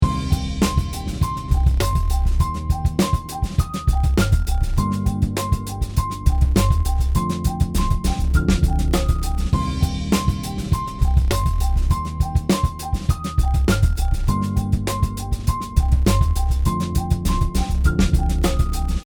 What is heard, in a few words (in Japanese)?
SSL 4000 プラグイン